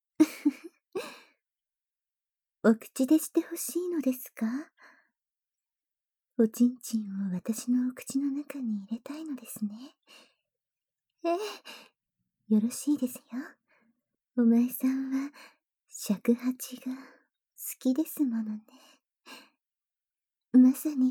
フェラ, 効果音あり; 雪女のお嫁さん～お耳の穴からお尻の穴まで、溢れる愛情でうんと癒して差し上げますわ♪～

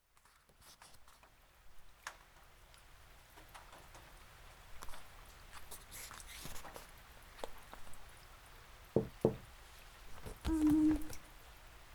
雨降りバージョン; あ～にき！いくら出す?

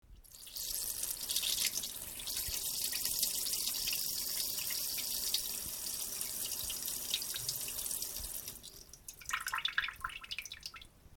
しょう ちゃ しょうばちゃちゃしょおしっこ, ASMR

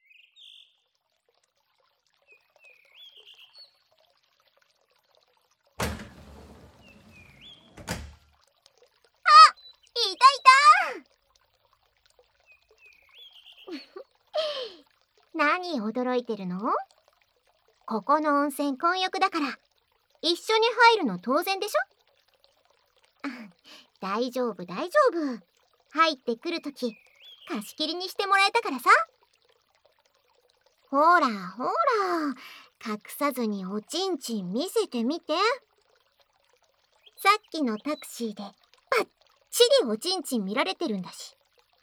お耳ふ～ふ～・お耳キス・耳舐め, ASMR; ミカの温泉パイズリご奉仕♪【お耳ふ～ふ～・お耳キス・耳舐め・パイズリ・パイズリフェラ・お掃除フェラ】

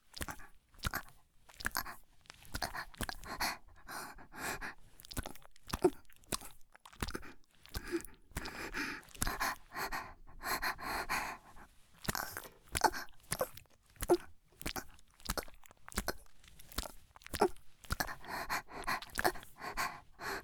ギシアンループ; 無感情ダウナーヤンデレ少女に弱みを握られて…

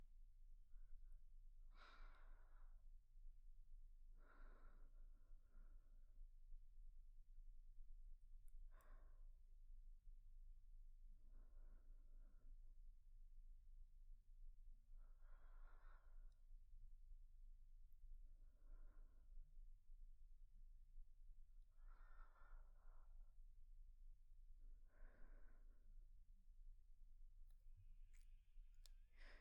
寝息 左

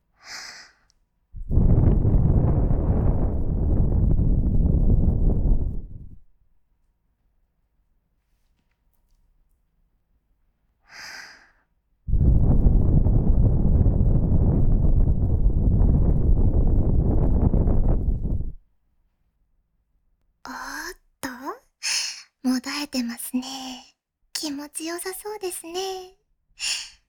耳ふーマッサージ, ASMR

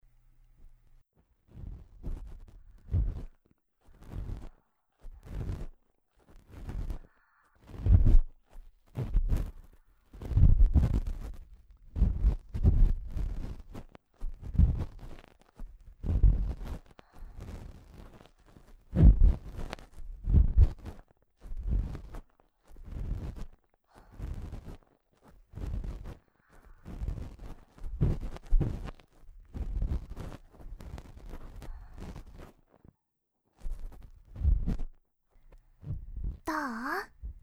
耳かき, ASMR

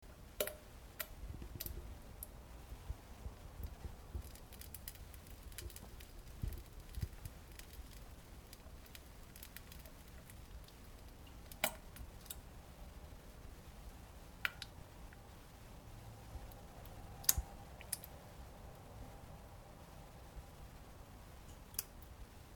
普通うんこ むちち ぴちゃ音

